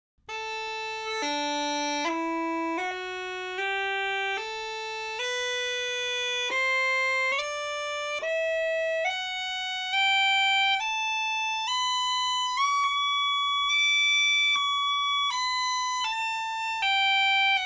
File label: Ebony Scale